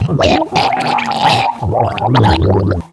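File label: suffocate male; DAoC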